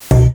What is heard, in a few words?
pfff THUNK